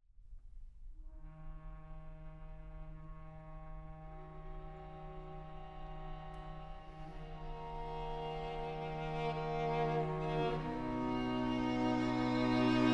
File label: Quartet